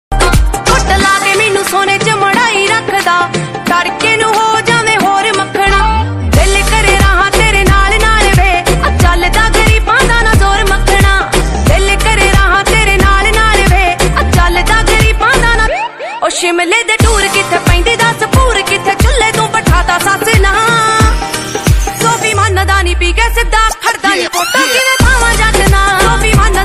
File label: Punjabi Ringtone